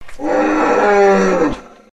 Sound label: trex roar